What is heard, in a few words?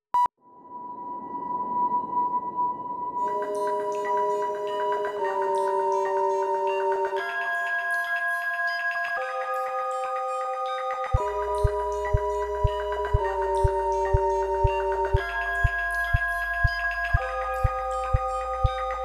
1k hurts